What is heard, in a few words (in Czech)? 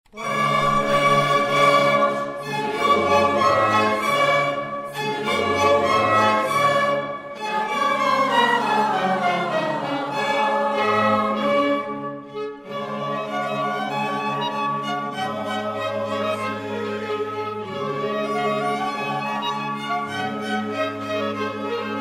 sbor; sbor ORTF SCHOEPS; Test mikrofon; sbor hl.dvojice schoeps